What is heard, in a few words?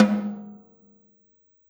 Snare Shard; ZeroGravity Drum Shards